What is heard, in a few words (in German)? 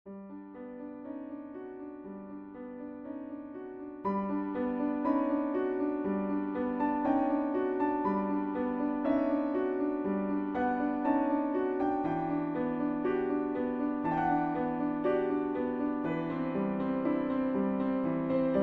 Wiegenlied der Muehle